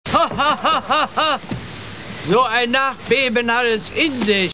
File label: hahaha